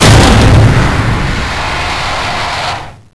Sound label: explode